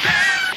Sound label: D2 STAB; Zero G; Man Machine